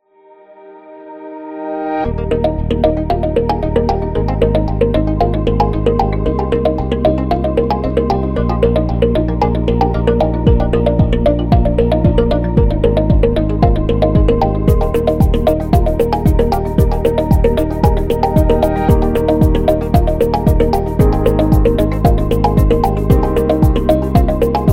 Yoga Corporate; corporate music vol 9

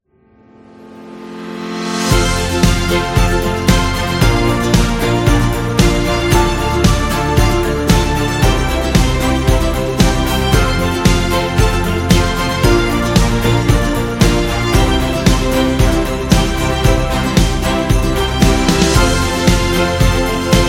Holiday Music, happy music; happy music vol 10

